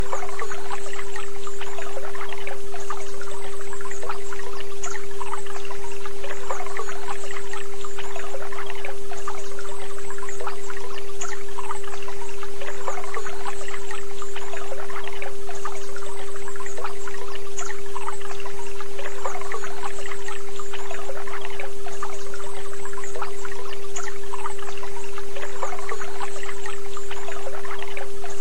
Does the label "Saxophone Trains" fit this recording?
No